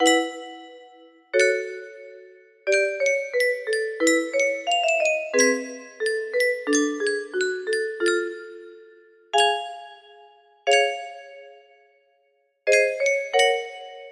improvisation, music box

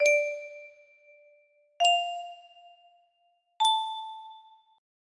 note tone samples, music box

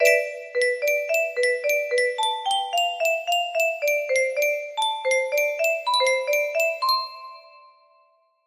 melody, music box

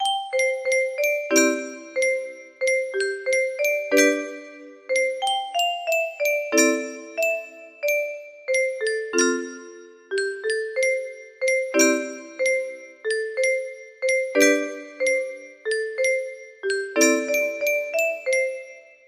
music box

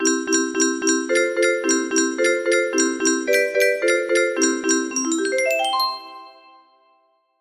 12 bar blues

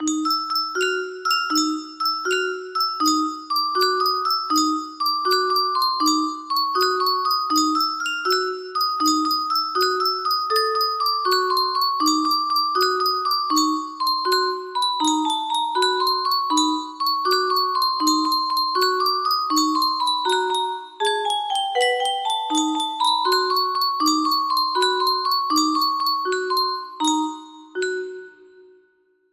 creepy music box

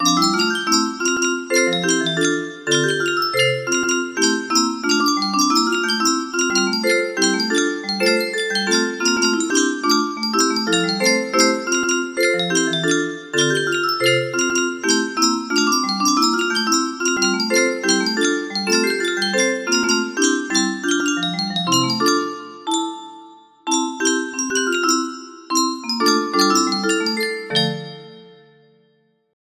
music box

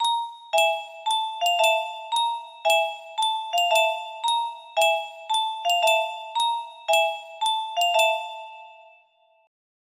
music box

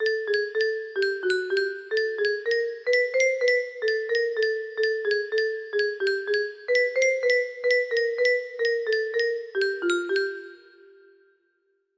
music box

